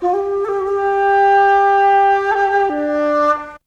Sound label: Flutey type sound